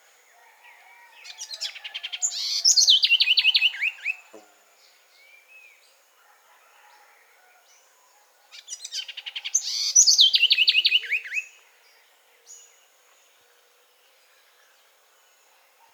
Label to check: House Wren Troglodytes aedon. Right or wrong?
Right